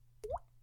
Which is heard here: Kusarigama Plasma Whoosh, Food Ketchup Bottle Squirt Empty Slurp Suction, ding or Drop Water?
Drop Water